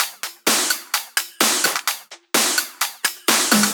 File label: Loops 128BPM, Drumloops